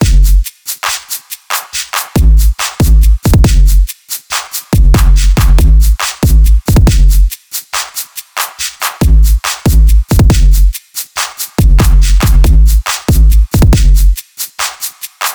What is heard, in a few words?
Hip Hop